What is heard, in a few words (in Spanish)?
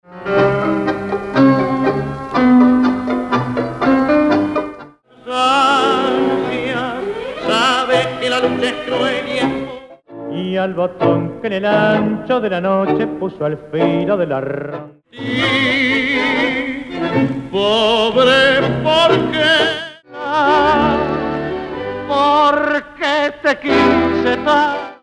tango